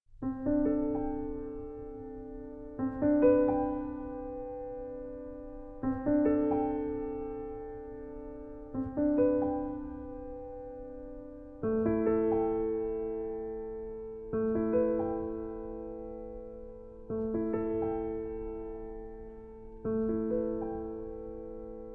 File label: Lullaby Solo Piano